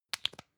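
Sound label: lipstick close hard, Bathroom; haus-garten; badezimmer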